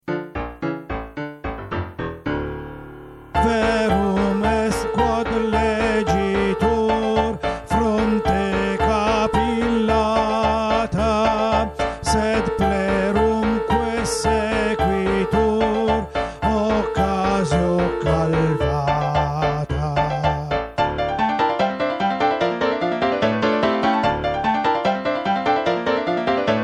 soprani, coro; Carmina

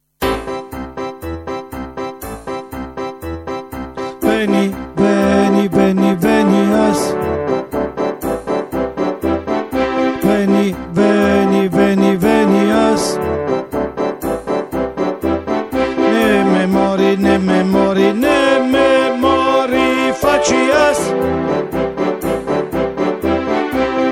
soprani 2 coro 1; Carmina

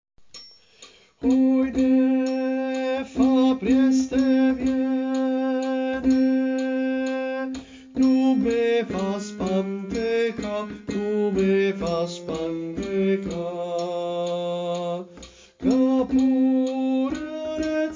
Tenori, coro; Coro Isola del Giglio

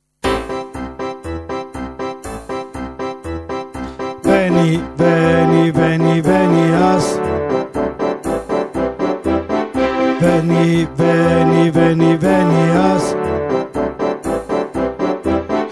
soprano 2 coro 2; Carmina

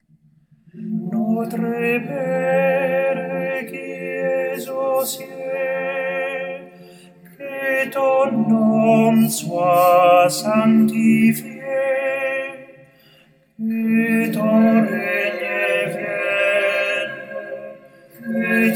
tenori

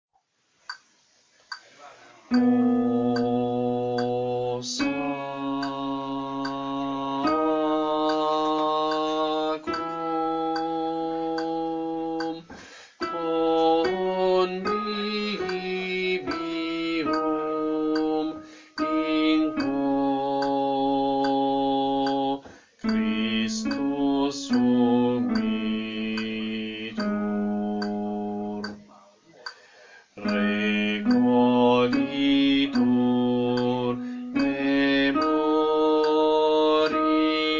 contralti, coro; Coro Isola del Giglio

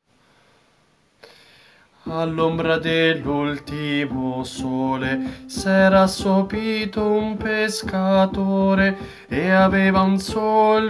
soprani; Coro Isola del Giglio

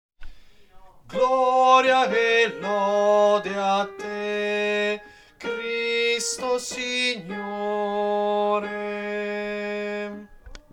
tenori, Coro; Coro Isola del Giglio

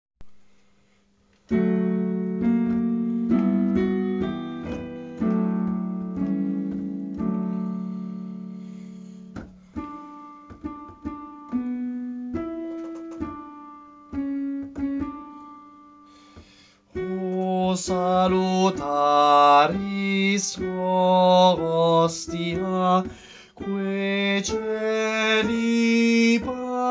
soprani, coro; Coro Isola del Giglio

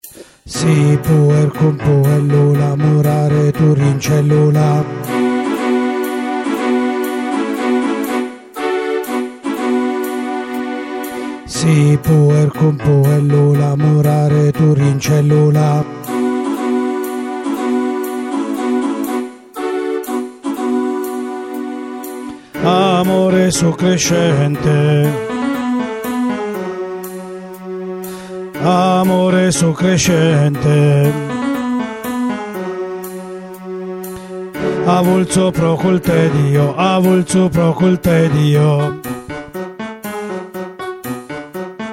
baritoni; Carmina

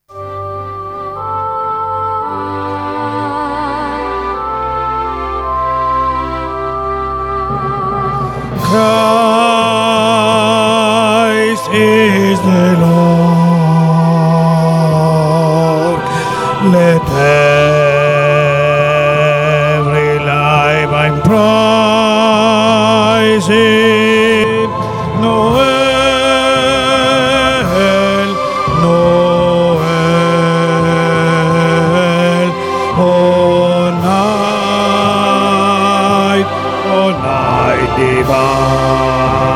bassi; O Holy night

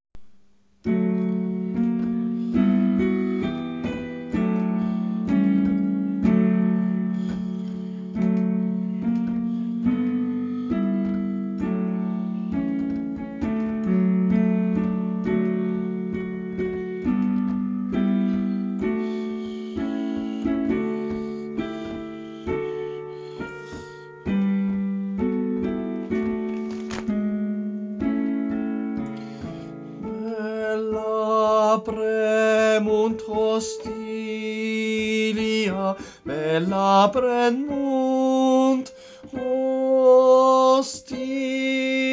tenori, coro; O salutaris Hostia

